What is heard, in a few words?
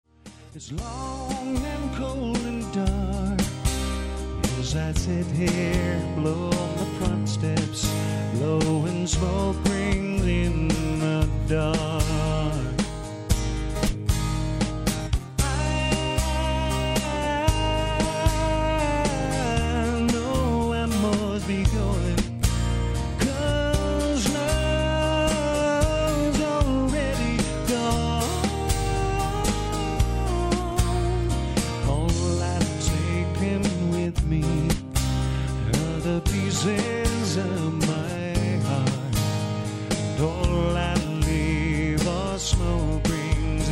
LIVE, Acoustic Guitar